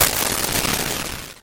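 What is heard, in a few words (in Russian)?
хрустящий взрыв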